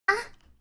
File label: lewd a noise; gura